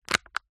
household plastic cup disposable handling pick up

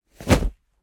Whoosh Cloth Leather Fight; Just Whoosh 3; soundbits

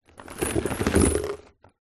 foley money coins in cardboard box move tip; zapsplat

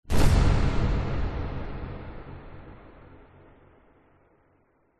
sound design cinematic hit boom loud bright airy

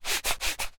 foley thongs wipe matt; zapsplat

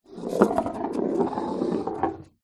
foley ball small plastic hard roll wood floor

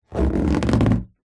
balloon inflated rub movement creak; foley

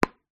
sport tennis ball bounce hard court

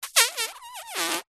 human air suck through lips

